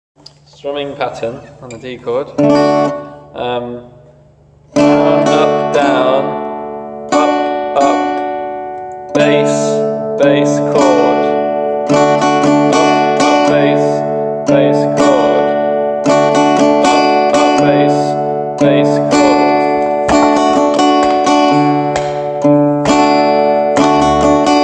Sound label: strumming pattern